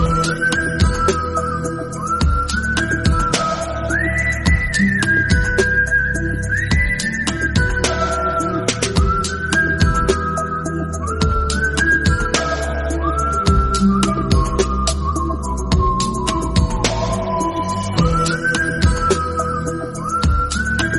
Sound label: whistle; mungaru male 2